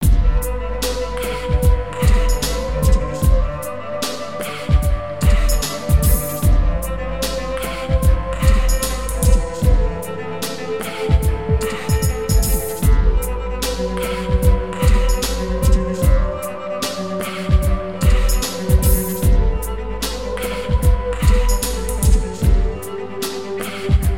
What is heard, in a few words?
bach cello mix; Cello Joy of Bach's Desiring (solo cello, choir & electronics)